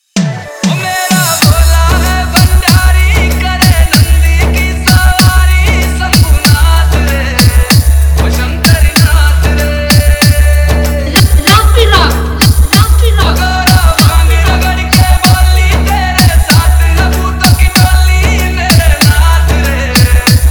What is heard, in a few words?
Bhakti Remix Mp3 Song